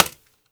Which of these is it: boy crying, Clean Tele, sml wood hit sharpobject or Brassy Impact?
sml wood hit sharpobject